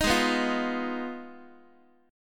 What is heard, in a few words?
G sharp Minor 6th G sharp down Guitar; G#m6 strummed; x,x,6,6,3,4; JGuitar